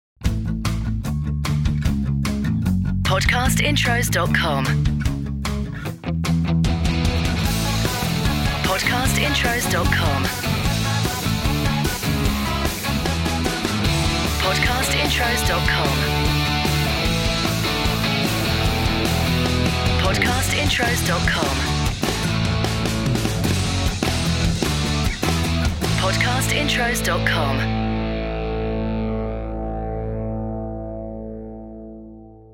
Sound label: Podcast Watermark ROCK